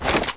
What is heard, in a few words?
hk416 foley